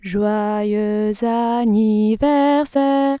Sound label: female anniversaire isochrone, the French song "Joyeux Anniversaire" / "Happy Birthday", sung by a female singer; CLEESE; randomly stretch each note in a recording of a song